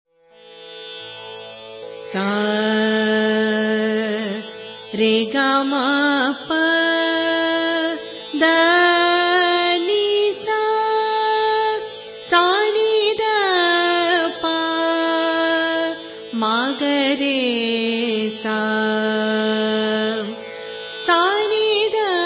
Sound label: kalingada, raga